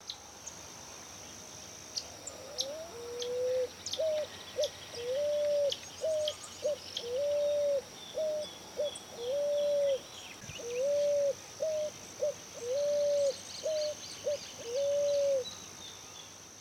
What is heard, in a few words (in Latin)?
Patagioenas picazuro, aves; Esperanza